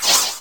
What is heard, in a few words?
Slide; mm7sfx